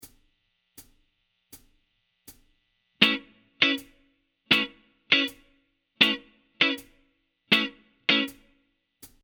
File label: cuting e, guitar